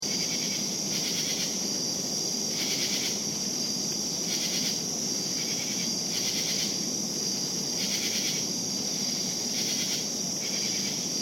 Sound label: Night Crickets Savannah Loop, Steady, exotic, hot summer night crickets; Can also be jungle ambience or African ambience; Cricket Sound Effects